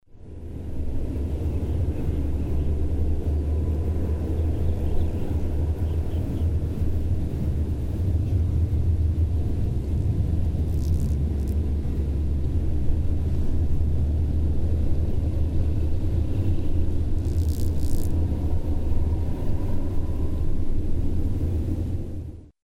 ambient short

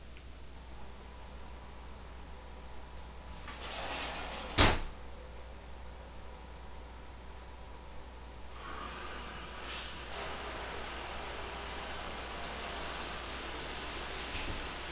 shower

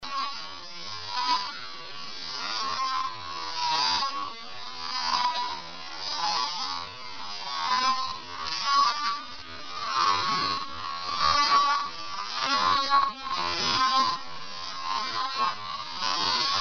teeth

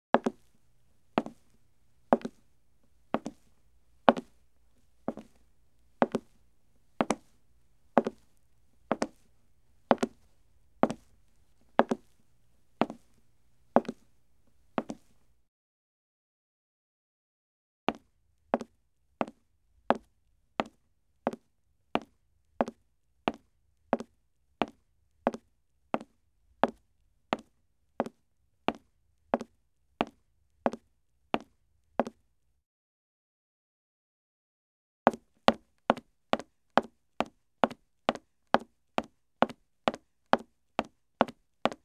steps wood slow; Asian Show Sound Effects

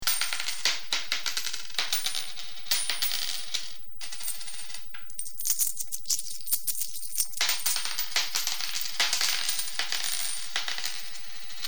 coins

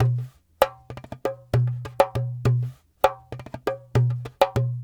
Dumbek

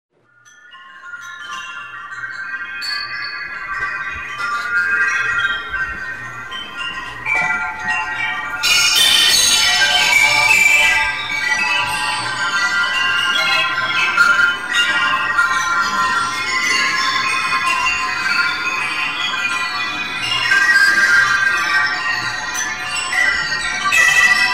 spring wind chimes; Here are my mom's wind chimes in my parent's backyard in wonderful Spri

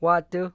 vox

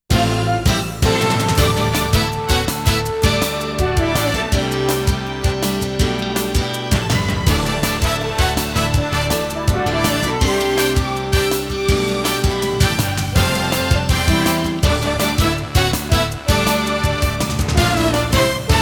news open

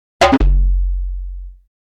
electronic bonk; music electronic; Asian Show Sound Effects

